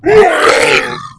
zombie attack

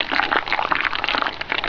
lava; svencoop

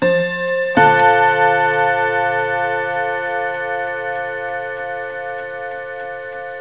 bell

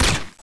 ber92f fire, weapons; firearms

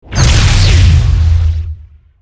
ice beam; tfc; ionsarmory

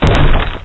breaking rock; ambient; ProjectMobius; devices

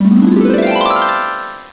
harp; svencoop

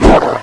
zombie dog attack run

